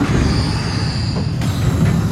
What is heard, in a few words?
centrifuge; Factorio